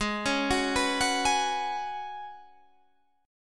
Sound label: Gb Suspended 2nd Suspended 4th Ab